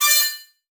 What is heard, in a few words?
Multimedia Internet CD-Rom Flash Beep Mechanical